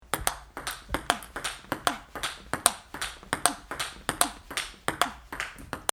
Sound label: pingpong